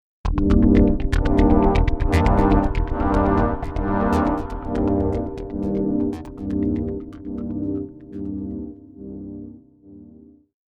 Scifi Audio Logo Ident, Rhythmic Underpulse Wavey Synths; Lynne Music